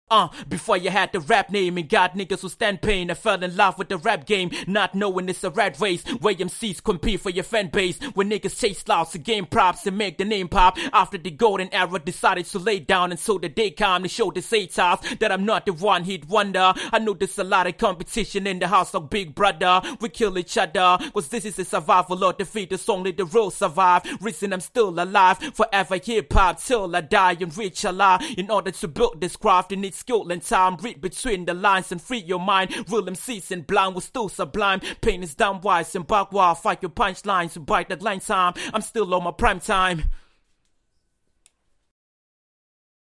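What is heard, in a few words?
hip hop 150 Bm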